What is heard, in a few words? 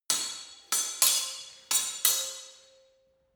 sword hit fast sword sequence